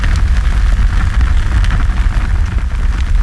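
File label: fire loop, engine; freeuniverse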